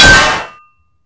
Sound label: metal; Rust